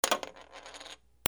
FALLING

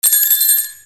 BELLS

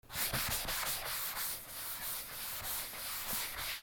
FRICTION

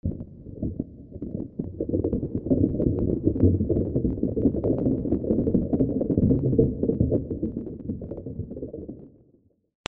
RAIN

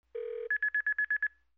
PHONES

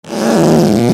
TOYS

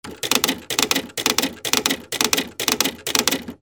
OFFICE

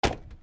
CARS

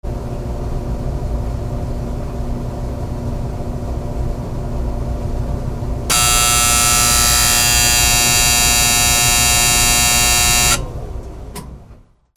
HOUSE

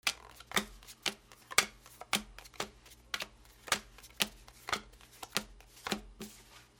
CASINO

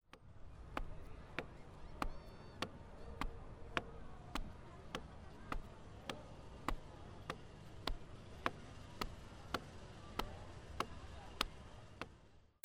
FOOTFALL

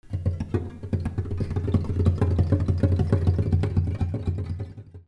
MUSICAL

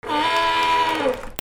ANIMALS

